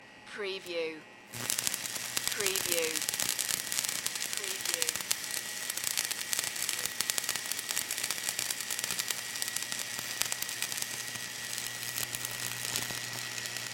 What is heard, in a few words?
Welder Run Sparks; Sound Effects Bible